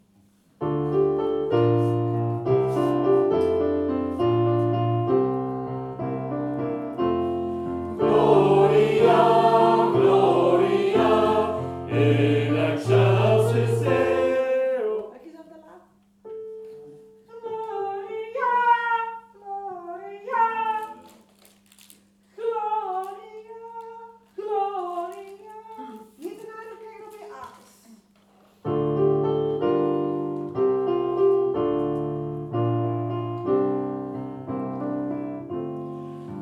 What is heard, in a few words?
Taize